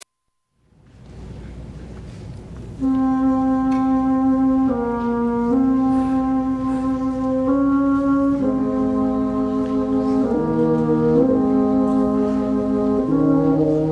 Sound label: Polish Carol; christmas